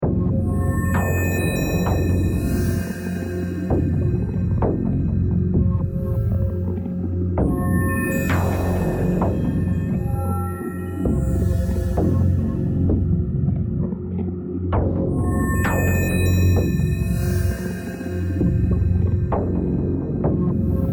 start round, music

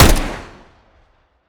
tec9, weapons; Counter Strike Model Pack; CS:GO

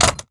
weapon shotgun impact; physics

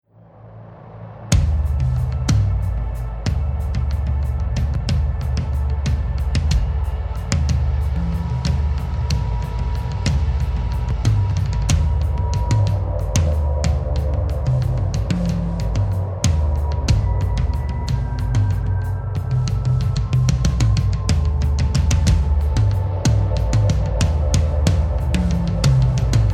start round, music

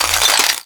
fs fm glass, footsteps; player; Portal 2

